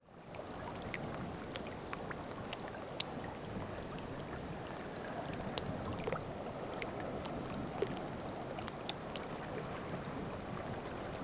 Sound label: Water Lap River Edge Gentle; Toybox